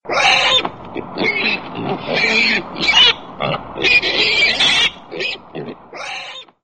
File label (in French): cochons